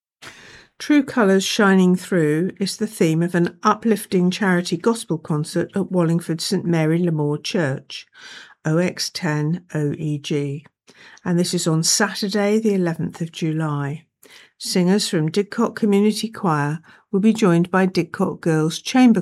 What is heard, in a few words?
Gospel Choir Concert